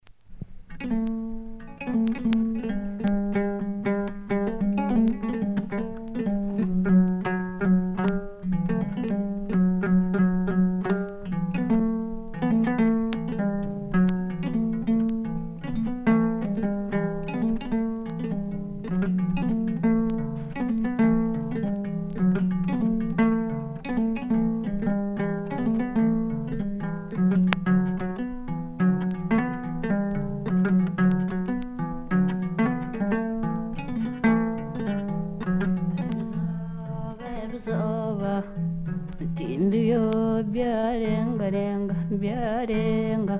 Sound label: Enanga Hima, uganda